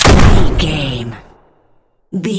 gun shotgun sawed off shot

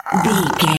zombie voice groan croak

